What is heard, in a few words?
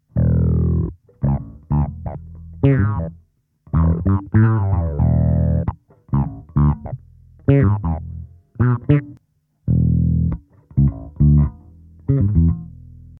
MXR envelope